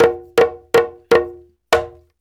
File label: BRKDR, Reggae; Spectrasonics; Supreme Beats; World Dance